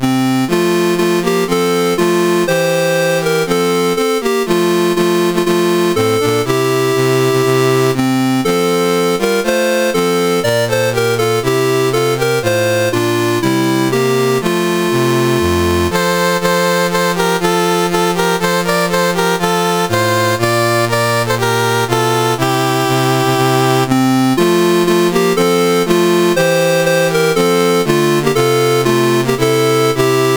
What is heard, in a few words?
CSG8580R5, National Anthems; Malacca; Dave Marquis